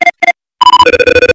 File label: phone signal